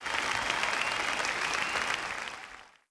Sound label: Clap; blue archive